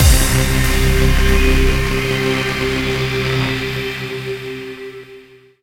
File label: stinger; Mirror's Edge (2008)